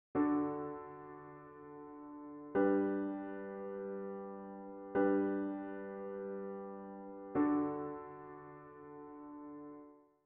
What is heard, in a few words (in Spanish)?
cadencia